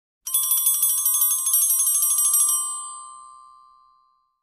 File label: Handbell ring lower pitch